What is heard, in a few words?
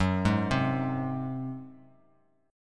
Gb Suspended 2nd Flat 5th Bass Standard; Gbsus2b5 arpeggiated; x,9,6,5